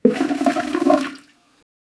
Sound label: quick slightly muffled, bathroom